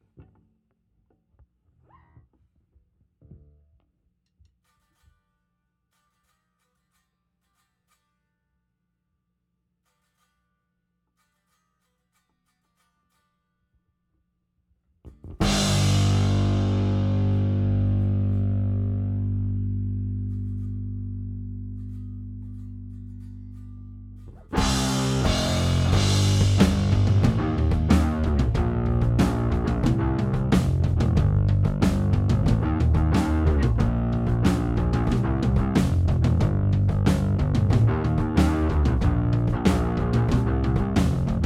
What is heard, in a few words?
Drums and Bass